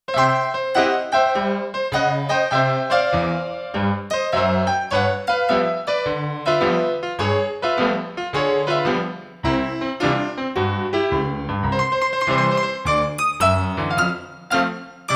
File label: Tack Piano, Roland D-70; Factory Performances